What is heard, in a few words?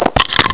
cam click